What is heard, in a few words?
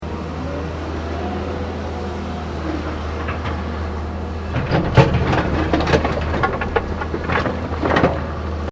Construction earthmover scooping rocks; Various construction machinery; SoundEffects Foundation2